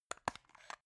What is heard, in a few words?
plastic remote battery compartment open